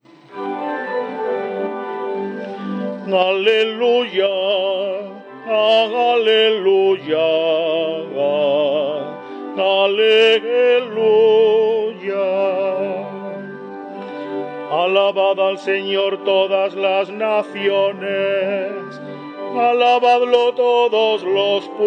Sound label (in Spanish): Aleluya Gregoriano